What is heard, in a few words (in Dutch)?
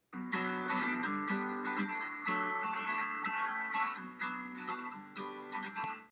Muziekfragment Hedendaags